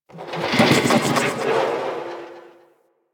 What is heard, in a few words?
Metal Scrapes; Ambience; Warframe; Corpus Railjack